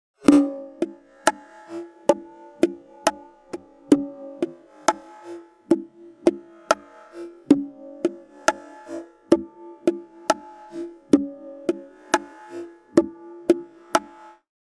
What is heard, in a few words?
Bongo